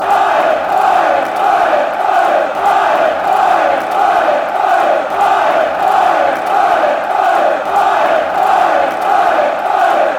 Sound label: irons irons fan chants